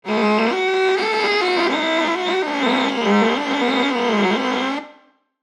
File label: Viola awful play string scratch